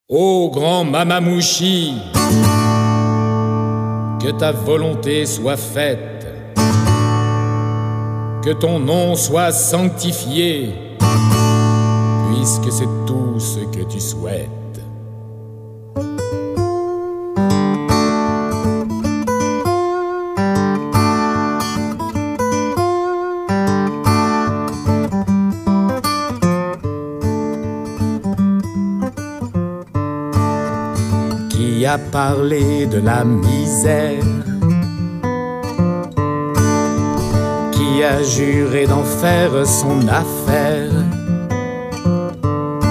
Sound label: Blues